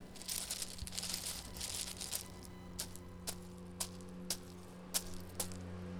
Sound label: jostling rocks